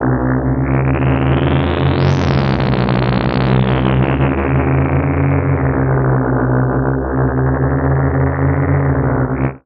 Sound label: drone c#, Alien & Drones (atmo); ambient; Chillout